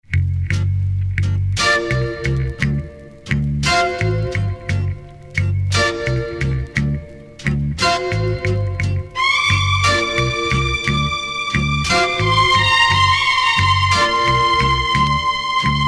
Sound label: strings scene